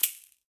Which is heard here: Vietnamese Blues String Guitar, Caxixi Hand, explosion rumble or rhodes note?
Caxixi Hand